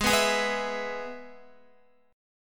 Ab Minor Sharp 5th Ab, Guitar; strummed; x,11,11,13,11,12; 4F,4A,5C,5G,5C,6E

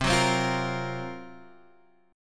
F sharp Major 7th Flat 5th C

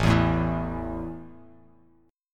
A 5th A down Bass Standard; strummed

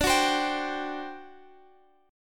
D 11th D, down; D11 strummed; JGuitar

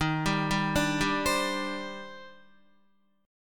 D sharp Major 13th, down, Guitar; D#M13; arpeggiated; JGuitar

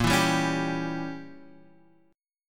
E Diminished 7th Bb

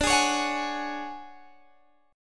D Major 7th Flat 5th Guitar Standard, chord; strummed; JGuitar

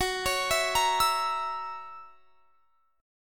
Gb 7th Gb down, chord

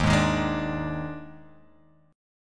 D sharp Major 7th Suspended 4th, D sharp, down; strummed; D#M7sus4; JGuitar

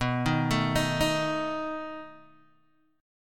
B 7th B down Guitar Open D

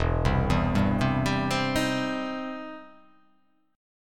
E Minor Major 7th Sharp 5th, E, down

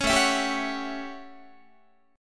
A 13th A down Guitar; A13 strummed

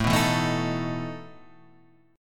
E Major 7th Suspended 4th, A

